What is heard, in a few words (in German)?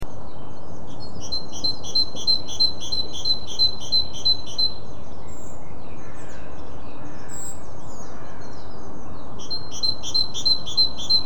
kohlmeise, rufe vögel; voegel